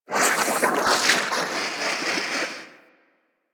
Landscape Puffer Plants; ambience; props; one offs; Warframe; Venus Open World

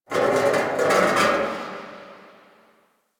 Metal Scrape Amb; Ambience; Warframe; Grineer Galleon

